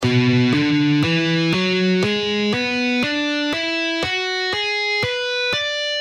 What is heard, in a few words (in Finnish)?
e9 asteikko